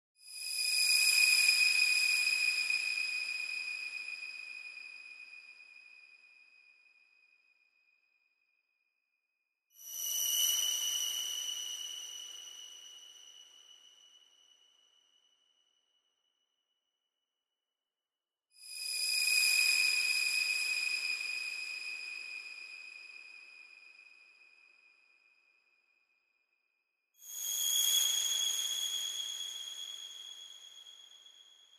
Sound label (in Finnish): korkea soundi; salatut elaimet